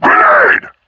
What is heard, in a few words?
grenade; hl2dm